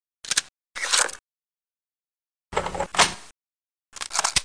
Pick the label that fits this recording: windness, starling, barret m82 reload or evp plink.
barret m82 reload